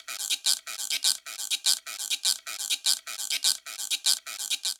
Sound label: Salsa Guiro 200bpm; Latin Percussion; Ableton; Factory Packs